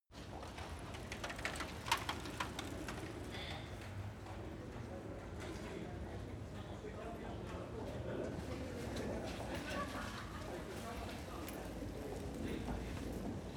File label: city europe pedestrian